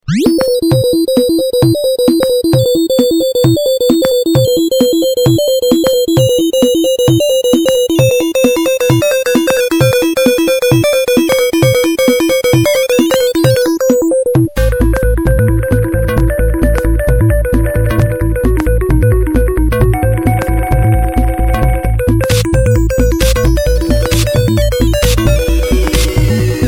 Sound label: zen music